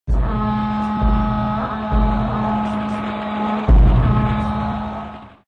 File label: Cut in SENGOKU BGM